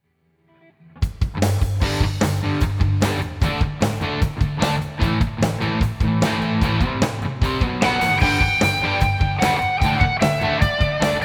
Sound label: Two String Modal Exploration